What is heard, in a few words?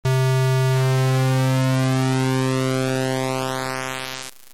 pulse; nord3